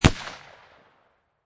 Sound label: Pistol Rhuger 357 Single Shot Exterior At 45'; Gun / Pistol: Rhuger 357, Single shot, Exterior, at 45 feet distance; Lynne Music